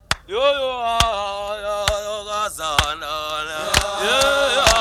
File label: Audience Applause, Applause